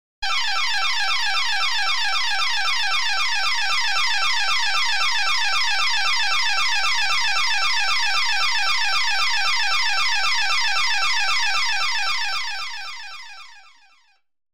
Alarms, Burglar Alarm, Electronic; BBC Sound Effects Library; Explosions Guns Alarms

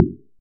impact Metal; Kenney's Sound Pack; Sci-Fi Sounds

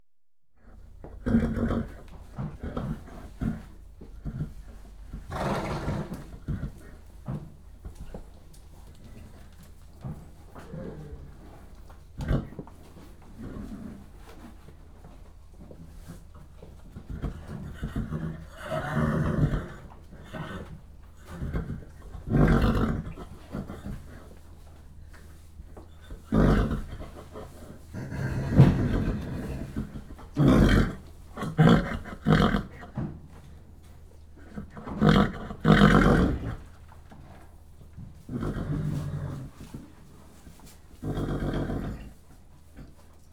Horses whickering in stables; BBC Sound Effects Library

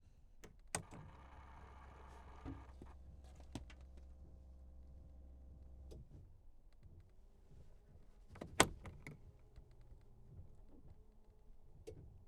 Textron WildCat X - Ignition Key; 2018; MS Decoded

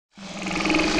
Movements; 99Sounds; The Weird Side Samples

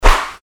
Fighting Swing Sound; FXHome

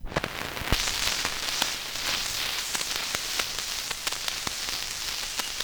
vinyl crackle; 99Sounds; Vinyl Noise SFX

